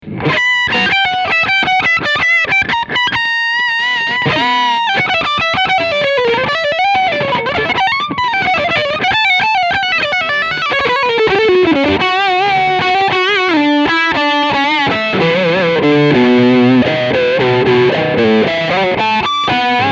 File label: Lead Steg Voicing B